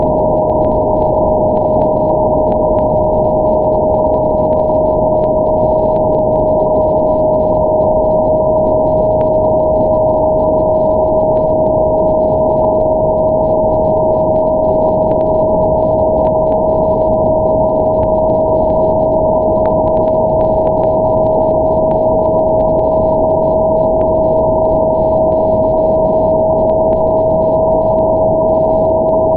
moon lander 2280.0 MHz approaching perigee; SpaceIL Beresheet; DF2MZ

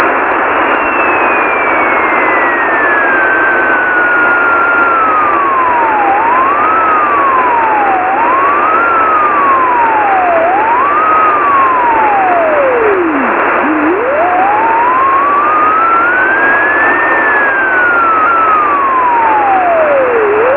s band beacon; uo-11; n5jdb

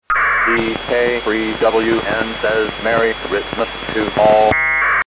merry xmas

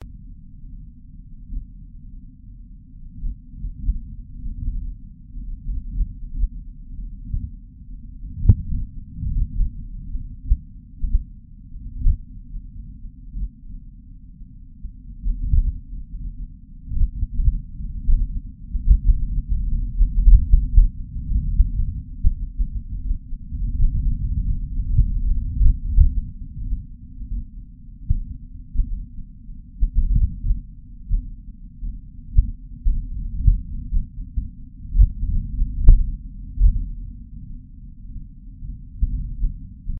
MARS Wind Mic; Perseverance Supercam Mic

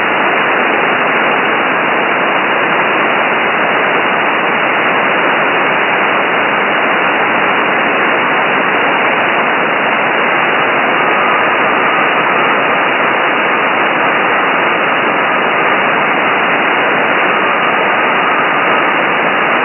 balloon; DL7AD; recorded by DD1US

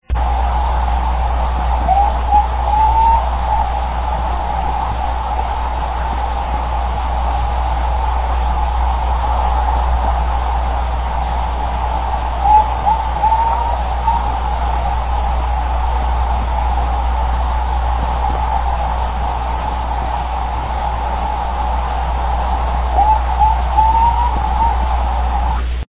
lunik

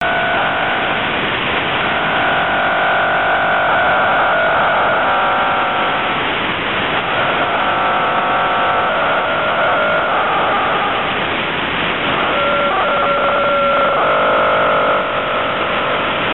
psk beacon 24ghz

